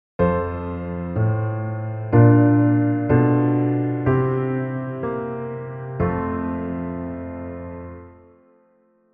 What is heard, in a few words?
raised IV, altered chords